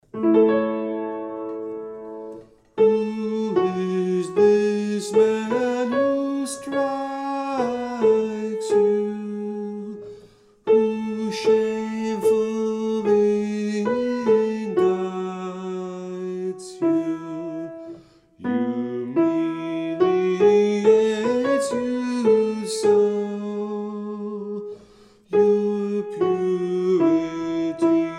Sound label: Alto 1st verse